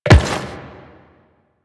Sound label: Step Metal, Footsteps